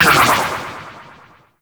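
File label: Galleon Turret Projectile; Warframe; Ambience; Grineer Galleon; Vor Galleon Fight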